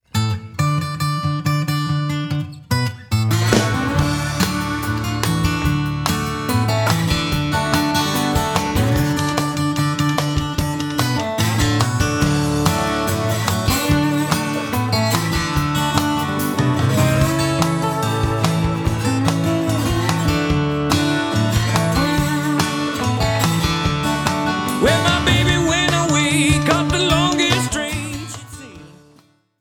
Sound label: Blues